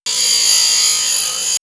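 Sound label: Buzzing, electronic fx; X-Static Goldmine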